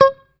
D6 sus, Strat guitar; Spectrasonics; Hans Zimmer Guitars VOL-1; JC-STRAT MTE

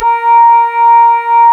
Synclav FM, WIND, A#; Big Fish Audio

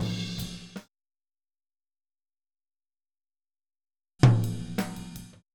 Boogaloo Fill Tom Rack, 82 BPM, Drums; Sonic Foundry (Sony Creative Software) - Drums from the Big Room The Mixes

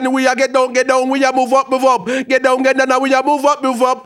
HAL RAGGAM, RAGGA-MUFF A maj; Sound & Vision - Gigapack II

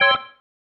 Stab, Organ, One Shots

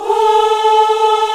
VOX African Ahs, African Aahs

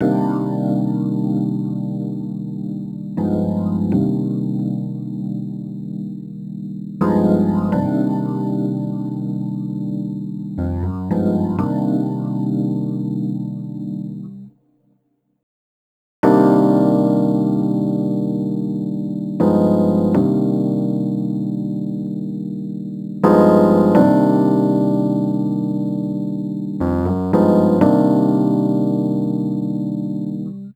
Rhodes Attacks; Ueberschall

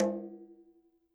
CL rm m, KILLER CONGA

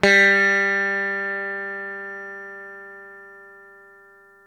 SITAR G 2, CORAL SITAR; East West; Steve Stevens Guitar Samples Collection

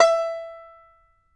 banjo E5, banjo notes, country; Sampleheads - Whole Lotta Country 1 & 2

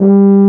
F tuba G0; Best Service; Alpine Volksmusik

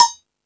Percussion, E-MU Pro-Cussion

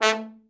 trombone; Best Service; Killer Horns

